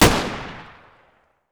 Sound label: vollmer fire; mg; garrysmod